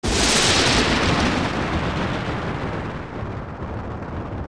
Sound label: Lightning; Barinade; zelda; OOT